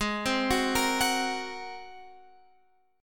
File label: G sharp 9th G sharp Guitar Open F; G#9; arpeggiated